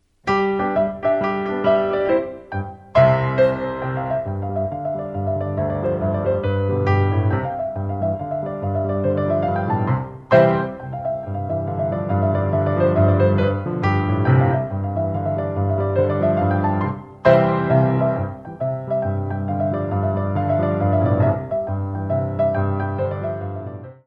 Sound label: tarantella